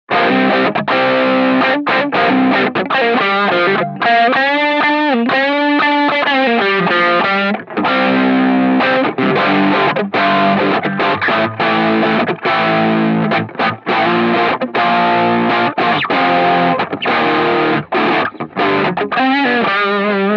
Distortus Maximus Low Gain

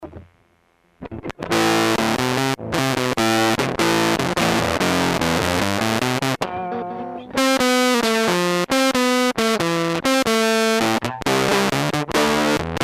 prostoy fuzz fuzz single

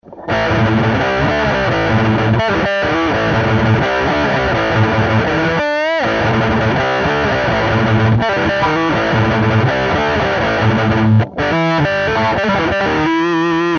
jackhammer distortion

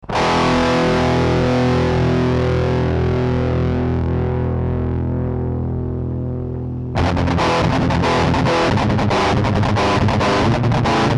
dist riff

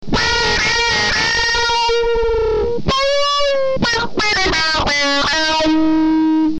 nursequacky lead

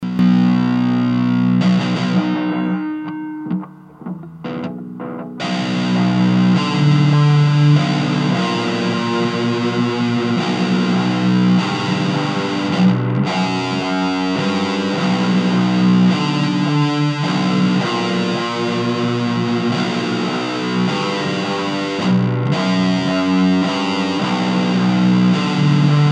EchoBase dist

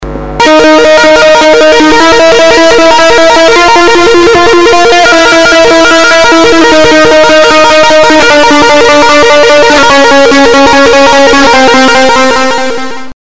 OD-1 Tapping; BC OD-1 Demo

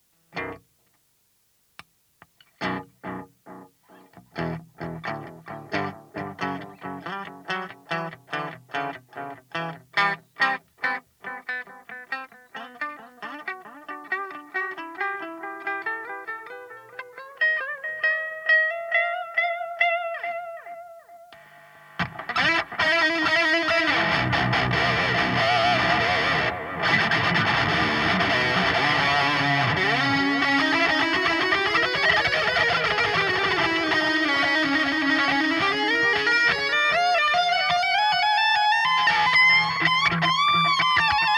Blue Delay

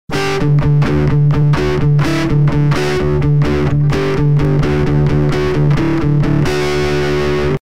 GTM Art Rock Voise

